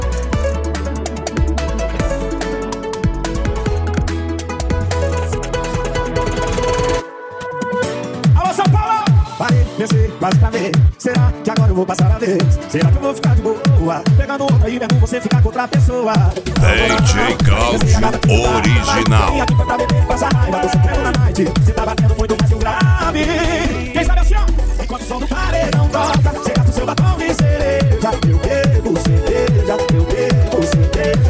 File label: sound car